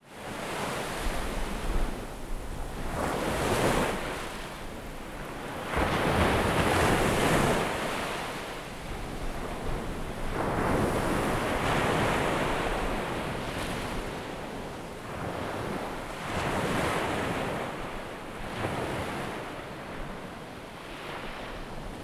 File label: Waves